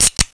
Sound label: open; Desktop Themes; Jolt Cola